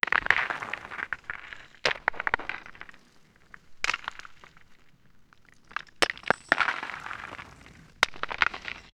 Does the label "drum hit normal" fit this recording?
No